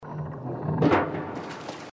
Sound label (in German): feedback falltuere geht auf; inside